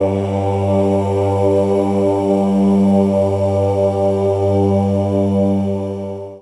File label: Riddim Choir G; One Shots; Fixed; Ghosthack; Riddim Essentials 2020